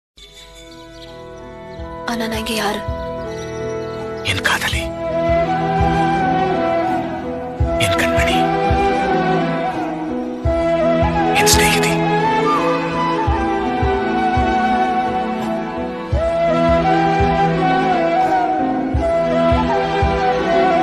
tamil emotional sad; manirathnam

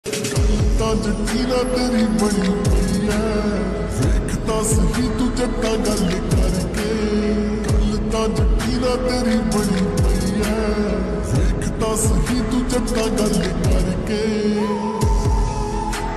new lofi ringtone new hindi ringtone lofi

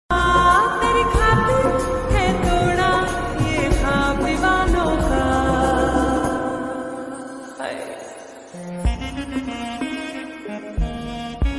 lofi ringtone slowed reverb

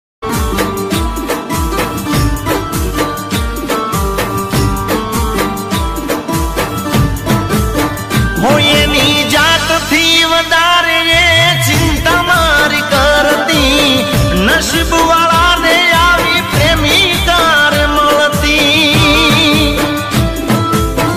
gujarati ringtone

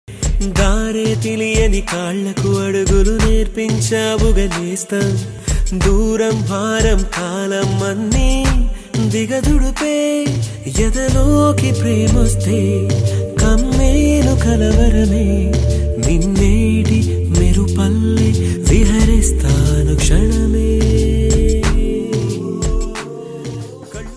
Telugu love song

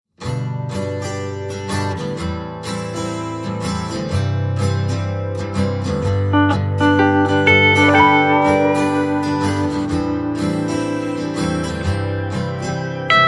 attitude ringtone

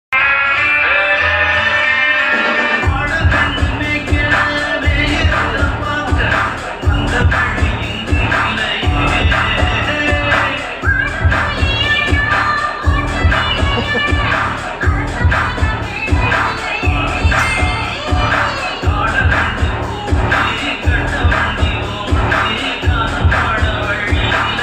remix bgm tamil ringtone; thailand trip; venkat prabhu

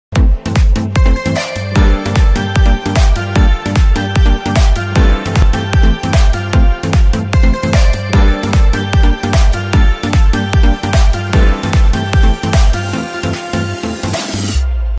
remix instrumental ringtone

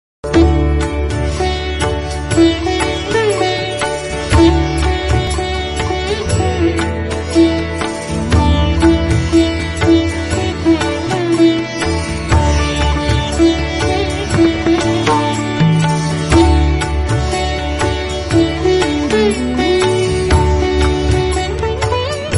gayatri mantra sitar ringtone bgm instrument

